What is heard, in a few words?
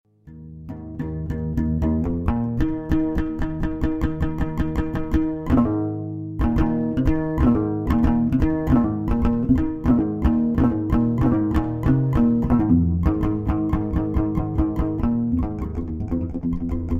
oud